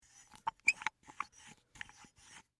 Industry, Bolt, Tighten, Screw, Squeak; Machinery Sound Effects; Machines

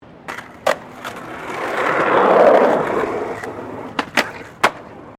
SKATEBOARD FLAT JUMP; Skateboarding; Sports

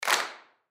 Human Crowd Studio; Crowd Reactions Clap Loud Single; Blastwave

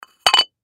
Clay Pigeon Down, Clay Pigeon Set Down; Miscellaneous Sport; Sports; Miscellaneous Sports (Sound Effects:Sports); Blastwave

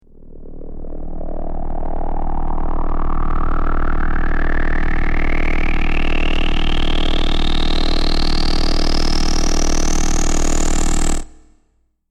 Eerie Swell, Slow eerie swelling transition; Sound Design

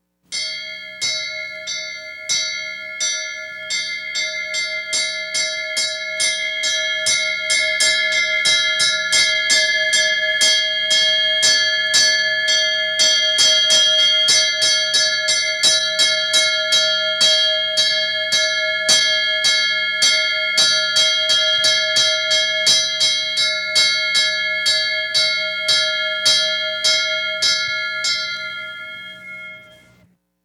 Fire Truck Bell; Bells; Musical

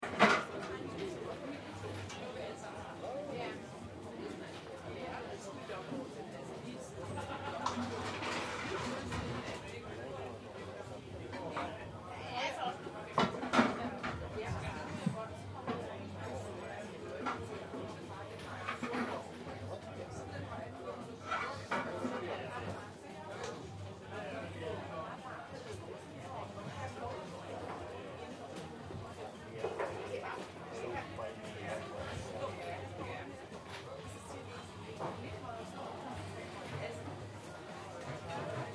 Ambience Europe Shop Close Up; Stores and Malls; Backgrounds

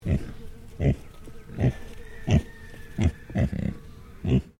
Animal Pig Tamworth Grunt Sequence; Hamish; Edgar's Mission Farm Victoria; Pigs; Animals

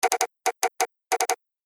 Sos Morse Code; Distress Signal (Help) Sound Effect

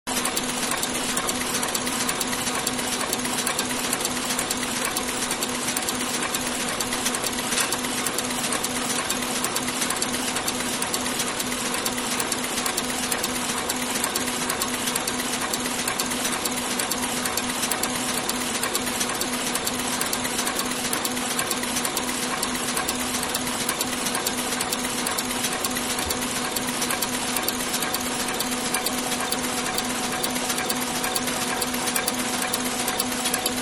Printing Press; Machinery; Machines; Manhattan Production Music

